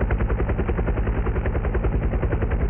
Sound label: Apache Move; shadez